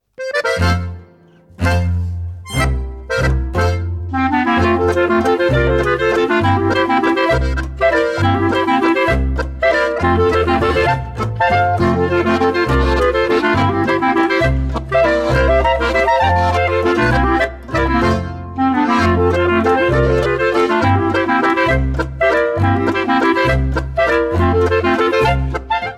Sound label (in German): klarinettenhalbwalzer